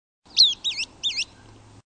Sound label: Tyrannidae, Empidonax hammondii; PNW; Passeriformes